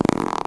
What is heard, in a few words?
splat